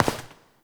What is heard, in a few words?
Step; Warframe; Koumei